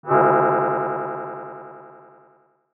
Tech, Weird Side Samples

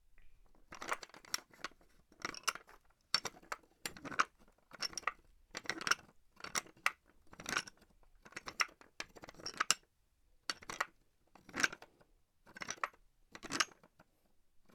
BOAT Swing 2 Pulleys Medium; Secret Source; 001 Boat Pulley

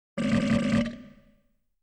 Burp; BBC Sound Effects Library

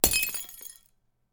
Glass; FXHome

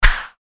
Fighting Swing Sound; FXHome

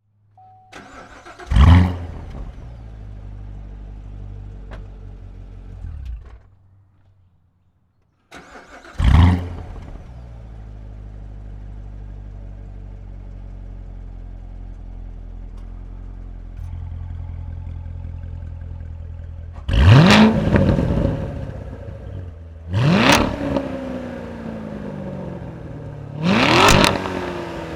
corvette starts stop accel from back, Corvette C7; Chupapsound

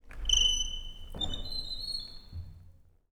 Wooden Door Creak; 99Sounds; The Warehouse

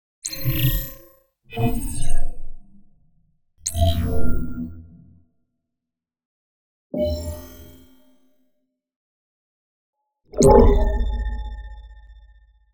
dark ui power on off dark, Dark Sci-Fi UI Sounds

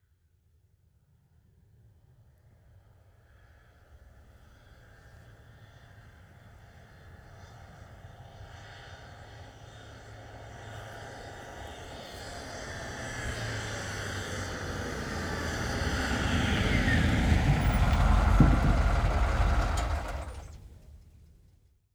Ambulance Approach And Stop, Idle; Emergency; BBC Sound Effects Library

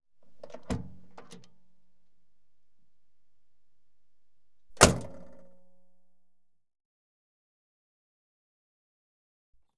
Car, Exterior, Tin Door Opens And; Auto; BBC Sound Effects Library

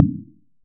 impact Metal; Kenney's Sound Pack; Sci-Fi Sounds

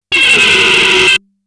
Aliens Monsters; FXHome

